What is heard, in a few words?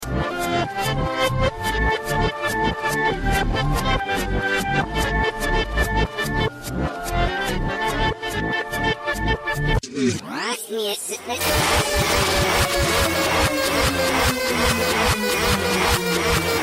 bassdrop, reverse